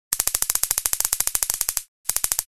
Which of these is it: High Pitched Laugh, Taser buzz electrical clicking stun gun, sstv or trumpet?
Taser buzz electrical clicking stun gun